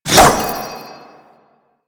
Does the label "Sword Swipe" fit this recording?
Yes